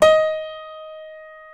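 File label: piano D#4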